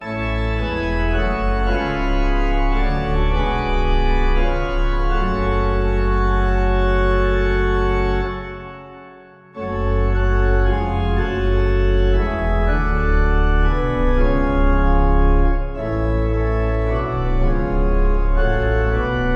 organ, Hymn; If thou but trust in God; Wer Nur Den Lieben Gott

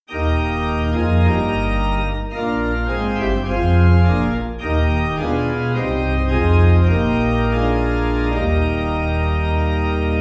organ; maoz zur; Hymnal 1982

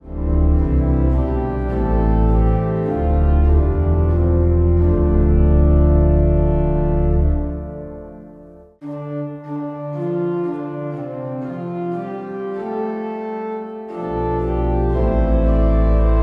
Hymn organ jam lucis orto sidere; Hymnal 1982

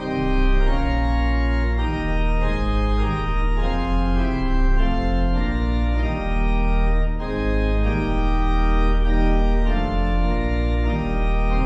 Organ; Deo gracias and Agincourt; Hymnal 1982

